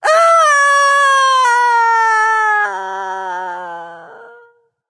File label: fear; smite; medusa